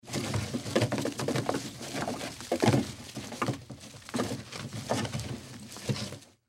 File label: Rope Movement, Rope Movement On Wood; Rodeo Sound Effects; Backgrounds; Foley; Blastwave